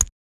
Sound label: gameplay sound when you, gameplay